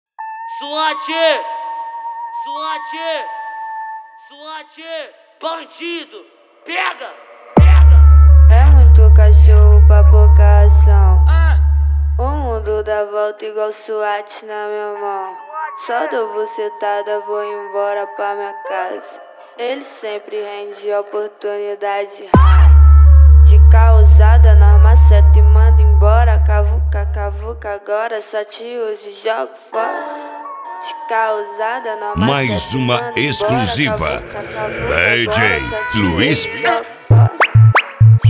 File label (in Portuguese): funk automotivo